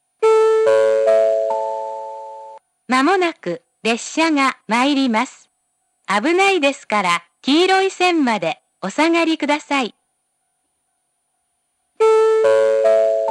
nittaki 1 bannsenn sekkinn

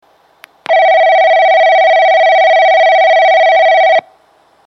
minami otari 2 bannsenn

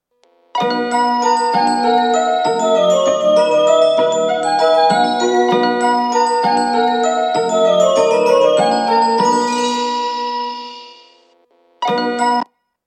kazusa ichinomiya 1 bannsenn

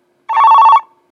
kurohime 1 bannsenn

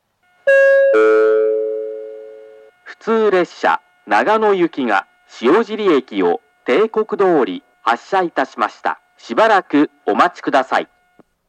murai 2 bannsenn shiojiri hassha

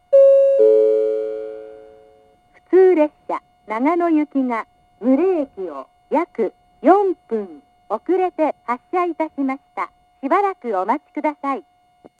toyono 2 bannsenn mure hassha

